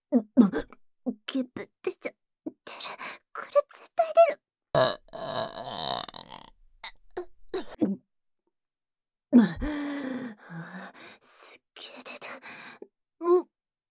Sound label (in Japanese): ゲップシーンまとめ